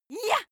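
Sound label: female attack yah, screams; schreie; menschen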